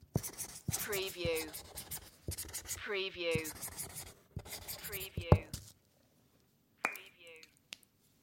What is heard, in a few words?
writing with permanent marker